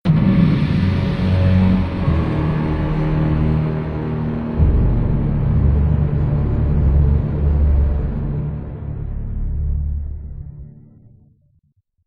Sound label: Fail; Sniper Elite Berlin 1945